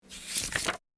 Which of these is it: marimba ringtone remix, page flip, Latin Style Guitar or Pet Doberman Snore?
page flip